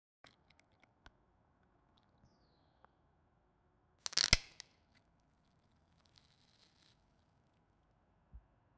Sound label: flower opening in timelap, flora